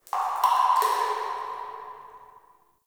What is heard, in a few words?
Root Cave Magic Drip E; Ambience; One Offs; Neathuns Root Cave